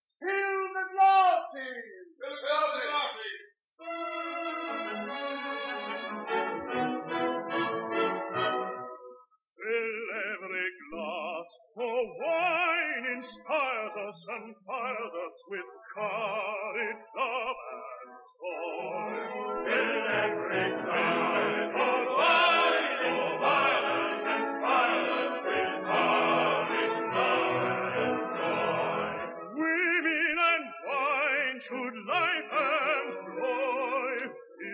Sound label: air